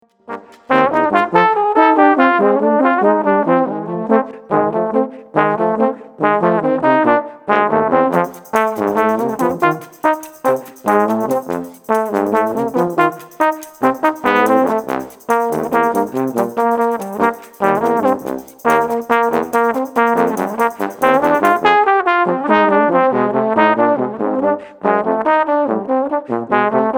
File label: Cajun